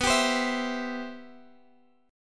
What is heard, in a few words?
C Major 7th, B, guitar chord